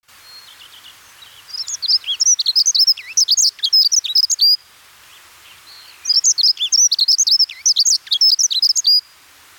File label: dunnock song